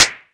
Clap; TRX

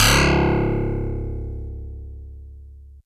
Harpsi FM DX100 Cs1

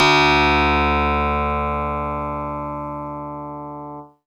Harpsichord DX100 D2

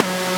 G# Airy Synth

